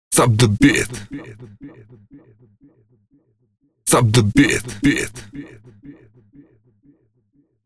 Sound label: Vocal 128bpm A; Zero-G Vocal Lix